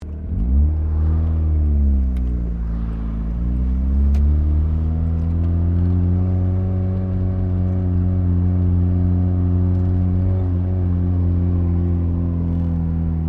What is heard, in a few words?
CAR MAZDA RX7 SERIES3 INTERIOR ACCELERATE FROM IDLE STEEP HILL; 1983; Cars; Vehicles